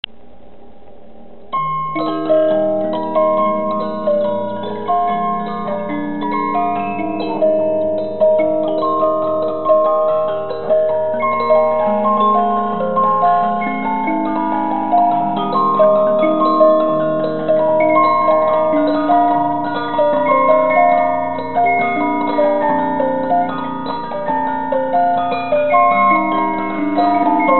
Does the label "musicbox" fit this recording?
Yes